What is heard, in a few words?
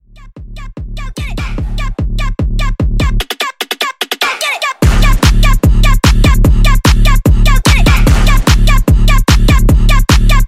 Dubstep Trap; Remixfreakz